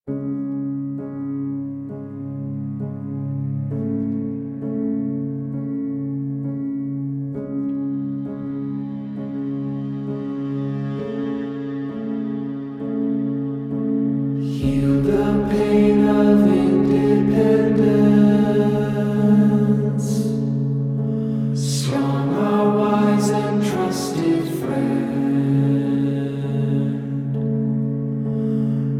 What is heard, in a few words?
Song; New War Part Two Solaris Narmer Song; Warframe; New War Full